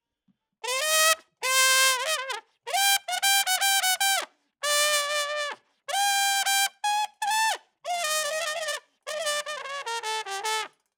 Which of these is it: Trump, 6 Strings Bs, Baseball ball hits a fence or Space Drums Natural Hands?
Trump